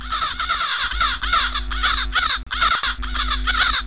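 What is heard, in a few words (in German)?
Krähen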